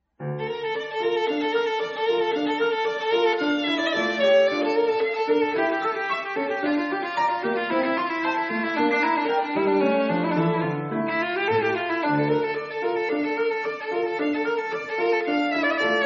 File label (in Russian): скрипка; Л.Дакэн